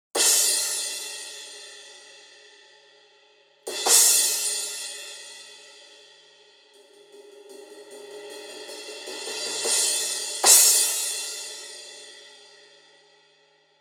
Custom Crash 16

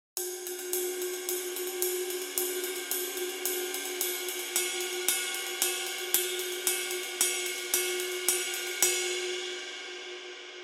Custom Pointer Ride 21

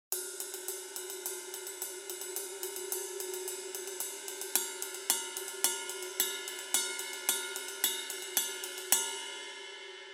Custom Ride

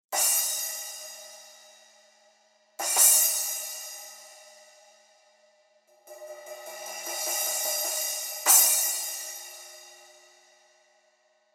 Iris Splash 12

